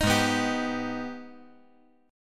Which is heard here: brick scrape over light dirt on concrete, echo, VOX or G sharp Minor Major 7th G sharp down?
G sharp Minor Major 7th G sharp down